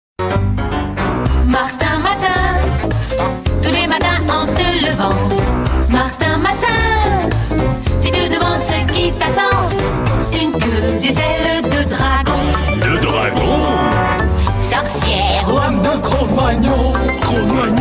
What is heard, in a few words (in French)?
generique; martin matin